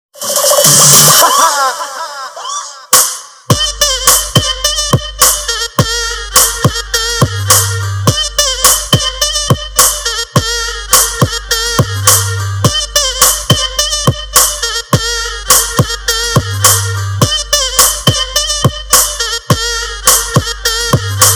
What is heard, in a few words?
Shenai Ringtone